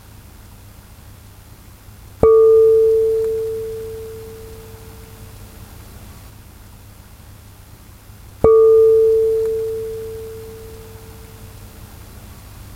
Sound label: vibration sound glass; ソリッドガラス; ソリッドガラスの防音性能